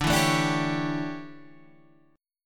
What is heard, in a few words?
D Major 7th Suspended 2nd Suspended 4th D down, chord; DM7sus2sus4; strummed; JGuitar